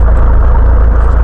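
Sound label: tank engine; forsaken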